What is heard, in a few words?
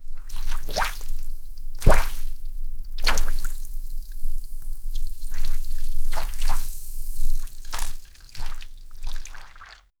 fabric being slowly dippe; cloth